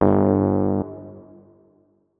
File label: notes, keyboard, electric piano